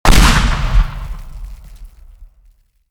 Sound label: explosion